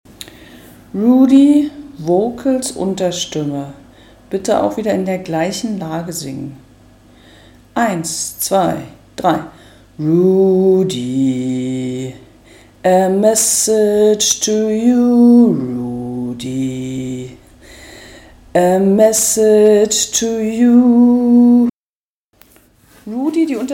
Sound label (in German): voc Unterstimme